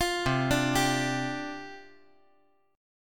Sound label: C Suspended 2nd Suspended 4th, down